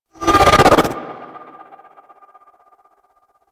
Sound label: Focus Operator Cast End; Warframe